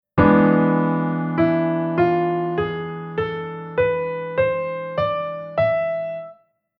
Chord Chord Scale Sec Dominant

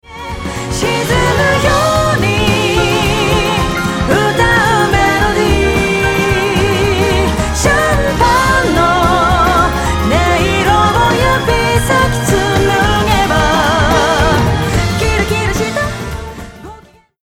Cho Bus Bal

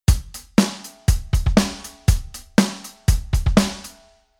Rhythm

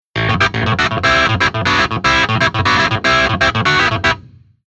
keyboard clavi amp

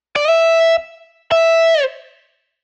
guitar bend

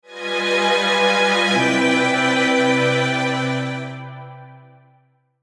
Strings Poly Divisi

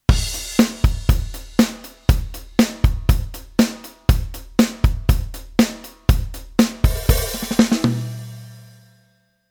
Velo; Midi Basic

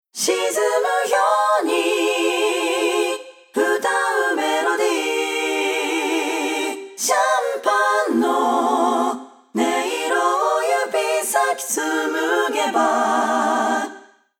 Mix Cho Bus Plate On

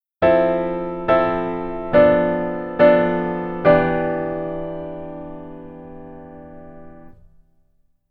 Keyboard Voicing Rootless Pf Only

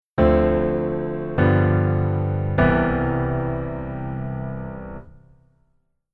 keyboard voicing low

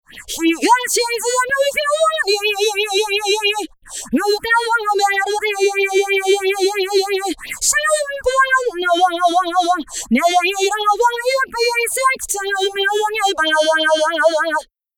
Phaser Rate High

